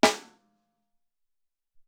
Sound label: Tite Hi Snr, Alesis SR-18